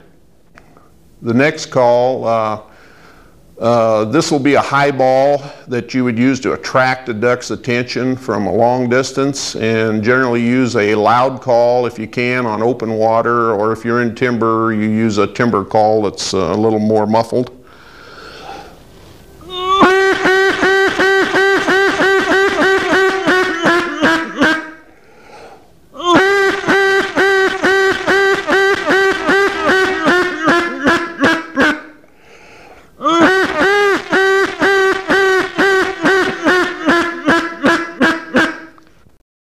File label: highball, Duck Calls